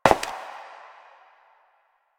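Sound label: Step Metal, footsteps; Warframe; Koumei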